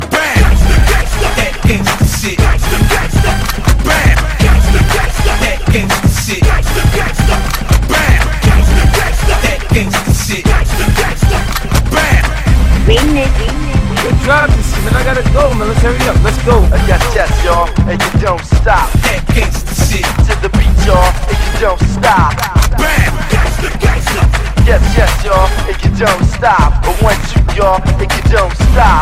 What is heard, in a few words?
black beat, hip hop